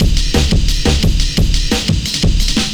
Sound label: Rock Amen; Jungle; Breaks; Blu Mar Ten - Jungle Jungle - 1989 to 1999 Samplepack